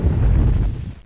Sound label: rumble; dosgames; roc